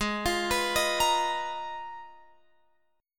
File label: G sharp Minor 6th Add 9th, Guitar Open F; G#m6add9 arpeggiated; x,15,17,18,18,17